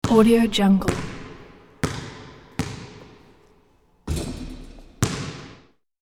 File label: basketball bounce shoot and miss; sound-ideas